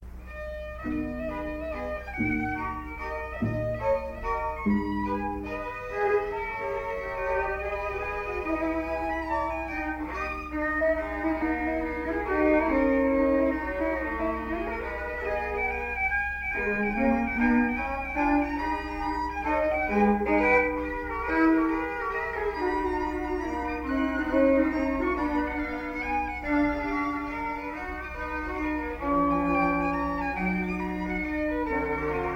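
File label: string trio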